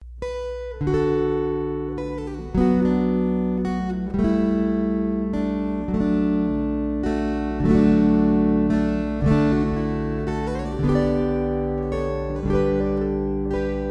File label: Waltzes